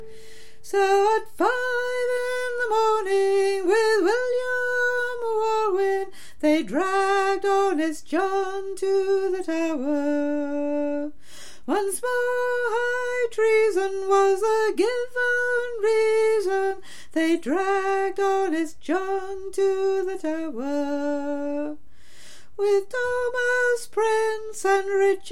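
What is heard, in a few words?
Tune Soprano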